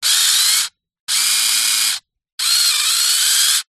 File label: science fiction robot motor server movements